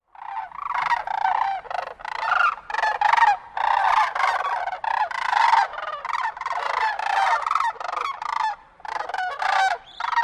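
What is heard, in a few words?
sandhill crane flock flying overhead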